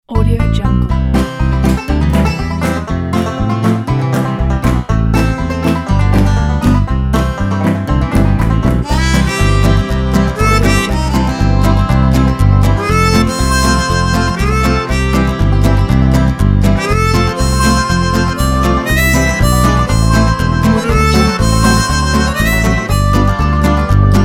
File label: western time